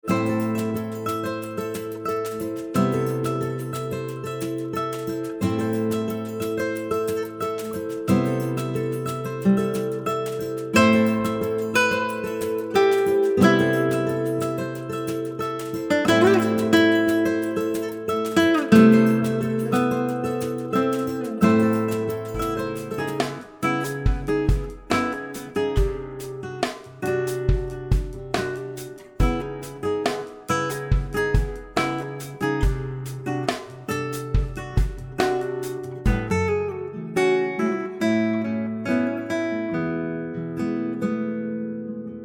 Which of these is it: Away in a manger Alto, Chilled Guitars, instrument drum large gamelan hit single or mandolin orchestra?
Chilled Guitars